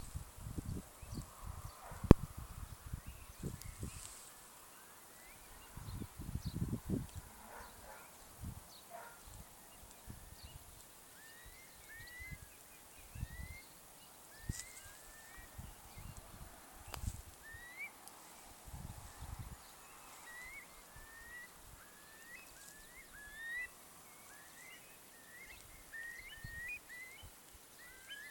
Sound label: birdsong